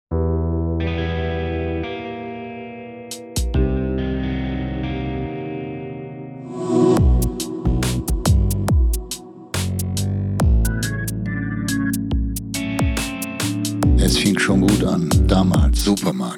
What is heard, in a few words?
C Blues